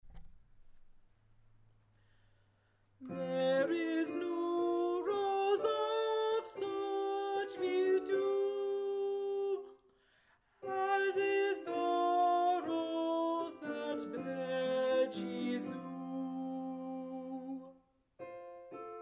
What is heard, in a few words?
There is No Rose Alto; There is No Rose, Anon.